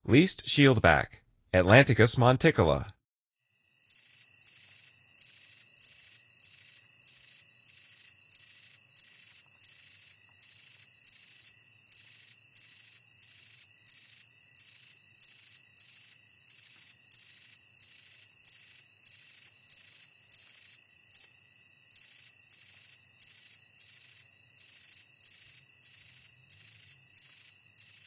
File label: Least Shieldback, Songs of Insects; N. America